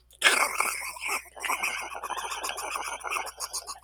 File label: Human sounds Digestive Gargling